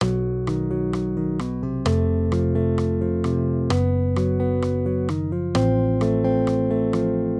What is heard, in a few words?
maj triads; Bb-E; accompaniment